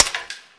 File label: silencer burst